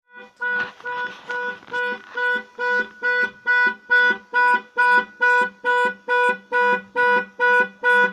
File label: s type normal alarm, s-type 4.0l v8; alarm passive problems; Supercharged V8 X200